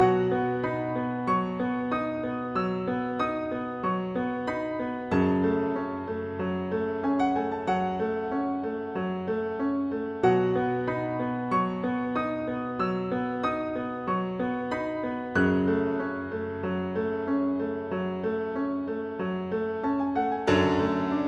Pan Piano; Shadow of the Game

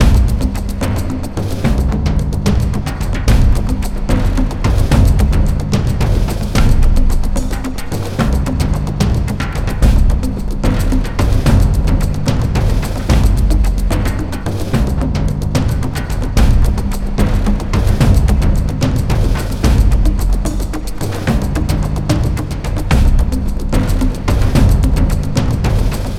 Idle LOOP; BrokenGarden

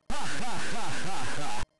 Shredder Laughs; Teenage Mutant Ninja Turtles 2: Back from the Sewers; Gameboy

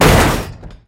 Common crash; Talking Tom Gold Run

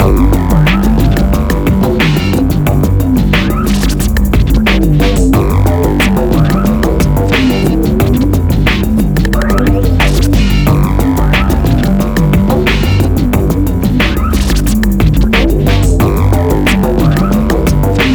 menu; Chaos Field

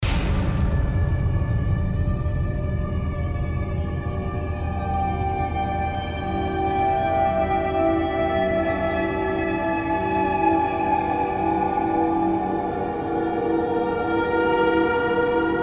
Stingers - Tension; Planet Alpha

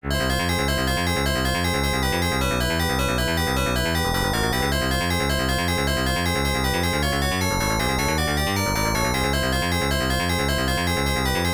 Unused BGM

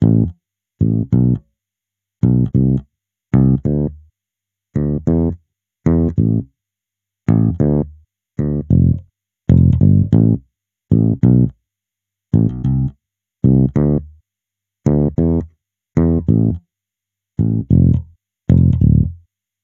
Elec bass; super crush k.o.

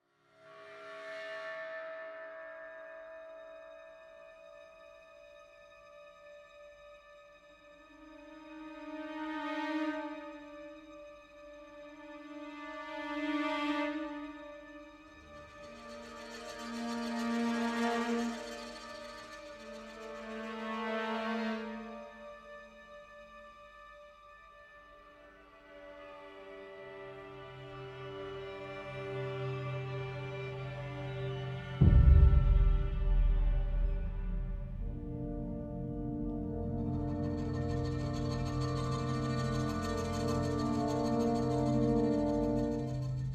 Ambient Idea Nonloop

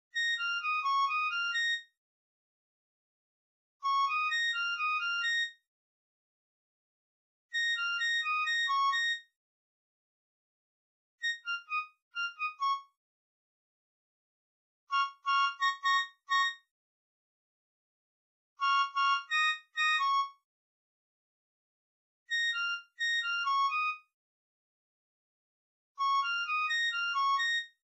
Beach Song

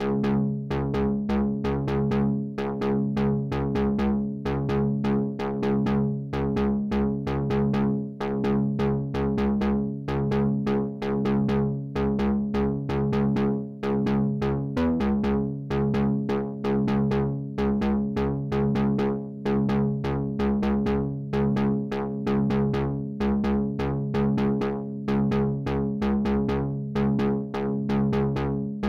CHORDS; rain world

